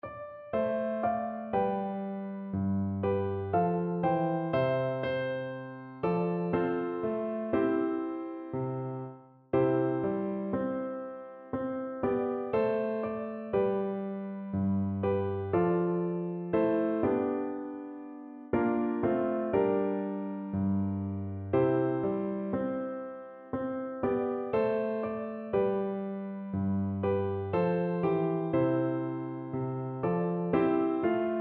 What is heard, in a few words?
wexford carol, Flute